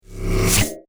Science Fiction Magnet Attract Super Power; Electrical; Sound Design

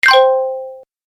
Award - Game Collect; Video Game Sound Effects

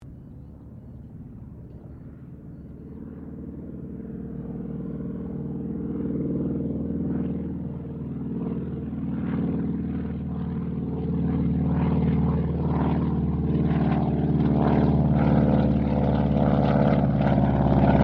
AIRCRAFT CA-28 CERES PASS; Airplanes (Sound Effects:Vehicles)

